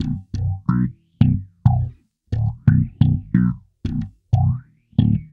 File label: 090 Pop Smallstone Bass Bmaj, E-Bass; Pop Rock; Pro Tools Essential Loops; Digidesign